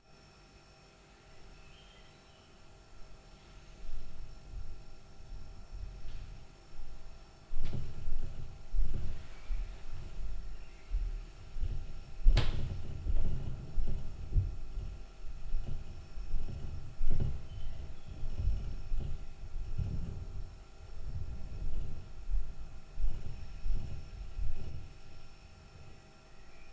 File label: Fridge + LF UPSTAIR NOISE, Night Noise